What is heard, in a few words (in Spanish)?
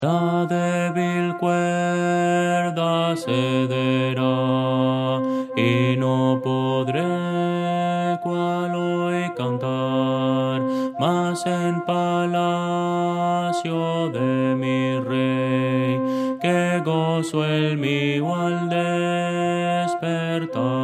contralto; la debil cuerda cedera